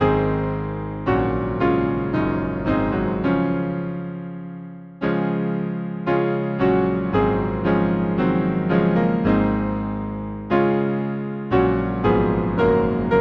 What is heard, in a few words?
piano, Hymn; O God to those who here profess; Caithness